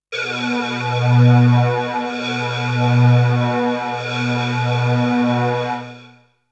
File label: Synth; ZERO G - Datafile DISC 3